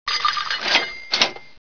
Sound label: big win, casino